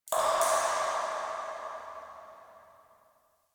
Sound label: Drips, Asteroid; Ambience; Warframe; Grineer Asteroid